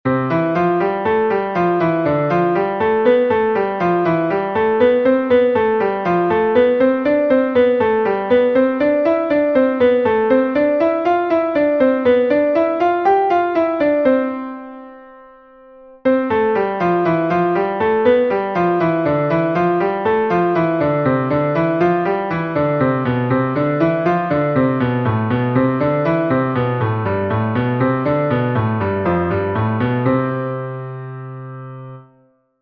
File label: Hanon Like Hand Warm-Up, Piano